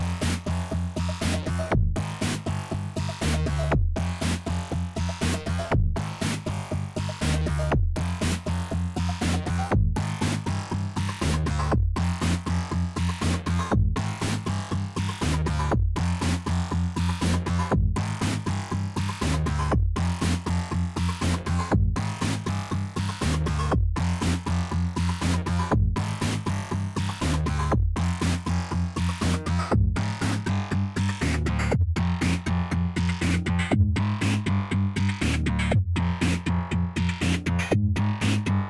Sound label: SpazeDrum; Dark Lighrs